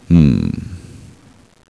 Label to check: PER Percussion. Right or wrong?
Wrong